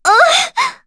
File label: Vox Damage, kr; Veronica; kingsraid